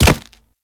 Infested Charger Step Default, Footsteps

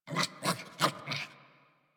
Puppy Idle Growl Playful; Warframe; Kubrow

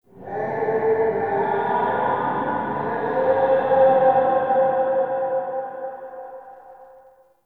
Root Cave Distant Moan; Ambience; One Offs; Neathuns Root Cave

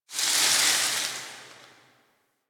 Tower Branch Disturbance; Warframe; Quests; TheNewWar; Ambience; Cetus; Unum Tower

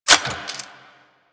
Reload C A; Warframe; Zylok Prime

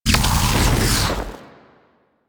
Flame Thrower Shot; Drill Sgt

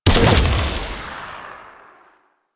Ground Spray; Enemies; Abilities; Warframe; Corpus; Arachnoid Hunger

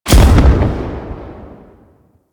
Army Proj Explosion; Warframe; Warframes; Hoplite

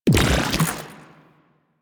Dispensary Burst; Warframe; Protea

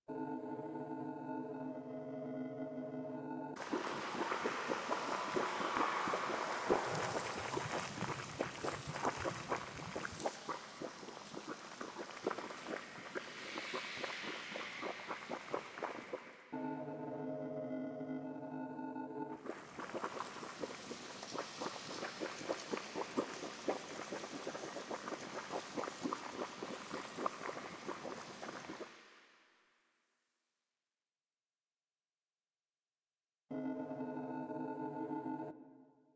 Queens Lair Amb Intro; Warframe; The War Within

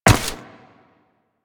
Step Ice, Footsteps, Walk; Warframe; Tenno

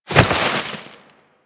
Generic Roll Snow; Characters; Warframe

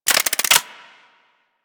Hand Shotgun Chamber Spin; Grineer

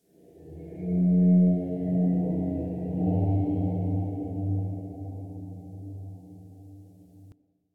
Metal Groan, Ambience, Locker Room; Warframe

